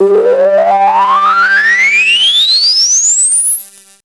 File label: FILTER L; AMG - Vince Clarke Lucky Bastard